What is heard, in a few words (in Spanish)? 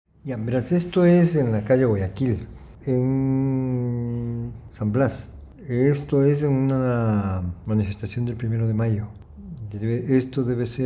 Bostezo Grito; exposicion pocho alvarez